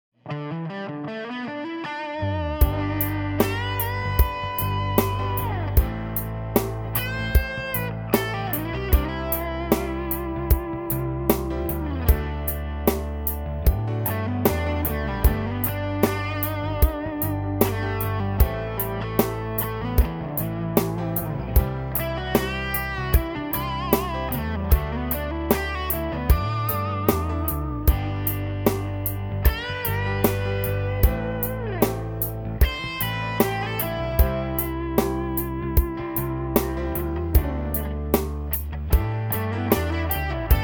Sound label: Roots Rock with basic drums; Drum Lessons